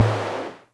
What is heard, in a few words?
Percussion Computer Tom; Atlantex MPC-1; Atlantex Music Percussion Computer